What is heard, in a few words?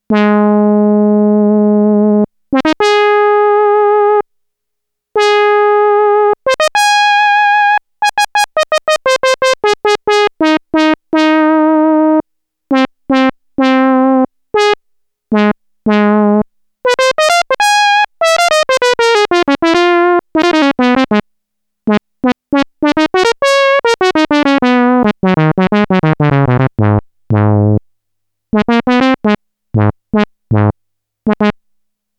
TRUMPET, Roland SH-101; Factory Patches